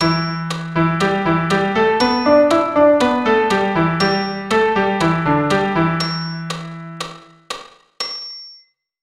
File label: Em pent